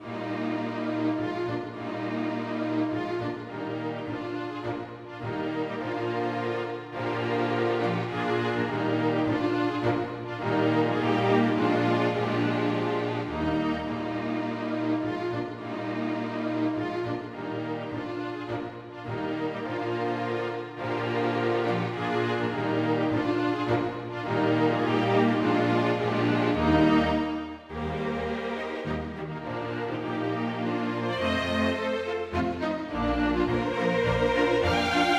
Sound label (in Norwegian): Menuett for strykekvintett uten trommer; Johannesen